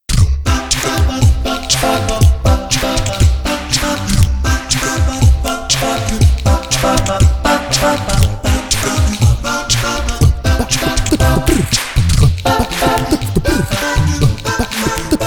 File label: Acapella; Pantech Discover-P9090; sky ringtone